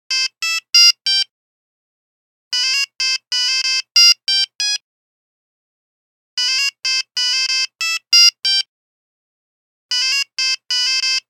Nokia Buzzer Tango; Windows Phone apps

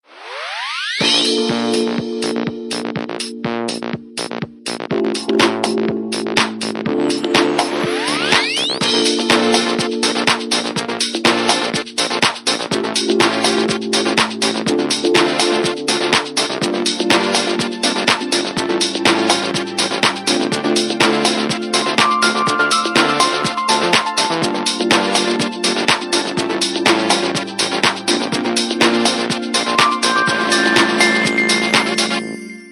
Lounge City; Pantech Breakout

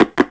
call drop

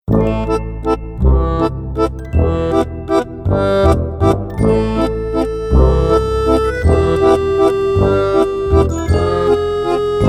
mysterious castle, alarms; ZTE Nubia-Z17-Mini

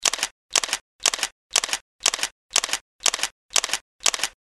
multishot shutter; LG VX11000 enV Touch

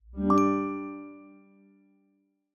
Charging Started; Google; Android-16-Beta

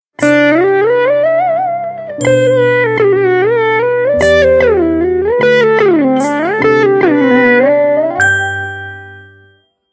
Nokia Tune India; 206-India; Ringtones

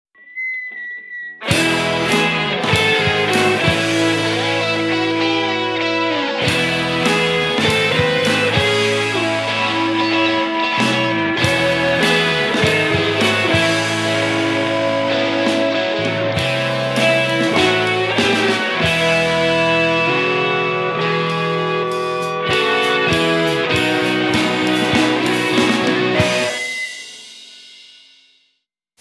Intro Rock, Windows Mobile ringtones

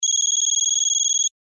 Ring Digital; Google; Android Open Source Project

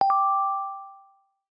notifier bells, notifications; Mozilla; Boot2Gecko

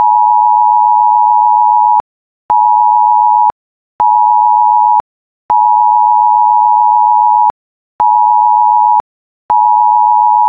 emergency alert, LG phonetones; LG X5 2018

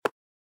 Knock

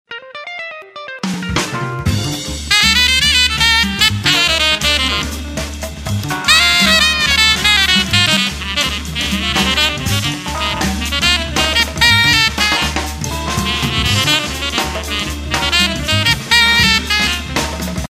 Jazz Bar; Samsung GT-B7320 OmniaPRO Turkcell

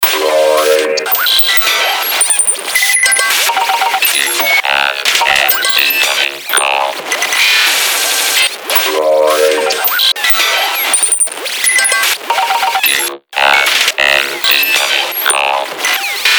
Droid Incoming Call, ringtones; Motorola Droid3

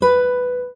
Classic Guitar

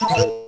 Call Disconnect; Samsung SGH-ZV50

